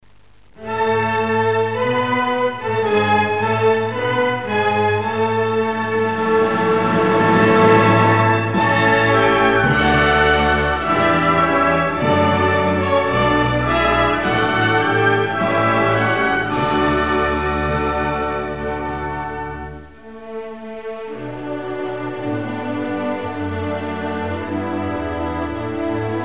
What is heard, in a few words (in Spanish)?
himno euskadi; simbolos